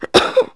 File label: cough